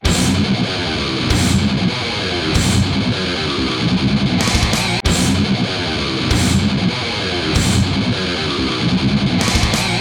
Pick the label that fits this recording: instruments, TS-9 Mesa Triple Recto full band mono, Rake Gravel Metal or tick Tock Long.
TS-9 Mesa Triple Recto full band mono